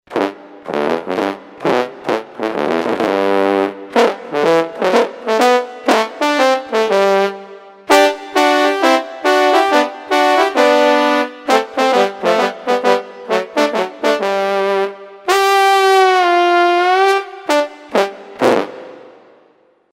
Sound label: trombone; moss